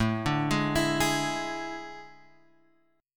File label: A Major 7th Suspended 4th, Guitar, Open G